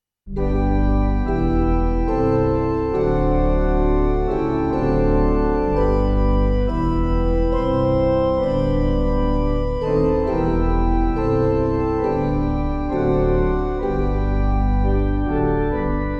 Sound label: National Anthem